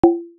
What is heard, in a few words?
music low; Asteroid-js